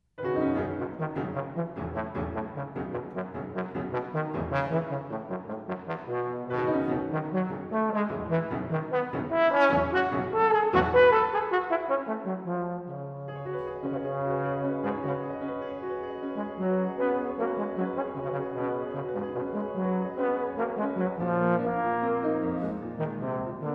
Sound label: Sonata for Trombone and Piano, Allegro Giocoso; Music for the Soloists of the ABQ